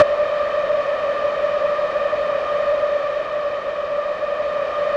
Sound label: D5, Yamaha VP-1; Music Store; 90's Sample CDs